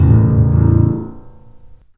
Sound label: LOHARM, The Piano; InVision Interactive